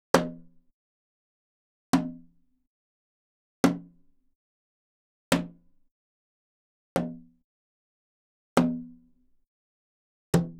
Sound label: Gamelan; Propeller Island - Complete Gamelan